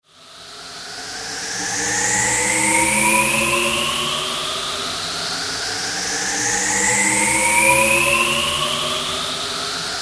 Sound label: matrix fx infinite phaser